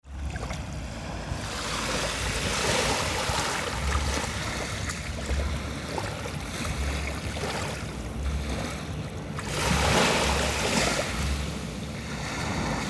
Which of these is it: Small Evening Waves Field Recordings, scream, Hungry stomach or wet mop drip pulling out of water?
Small Evening Waves Field Recordings